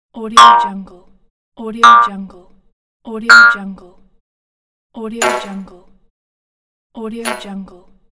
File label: jump